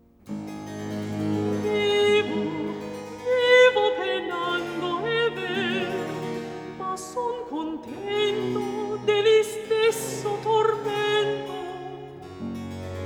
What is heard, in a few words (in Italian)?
Recitativo; Clori vezzosa, e bella; Vivo, vivo penando; Scarlatti; Cantatas